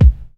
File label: boomy kick drum sample c key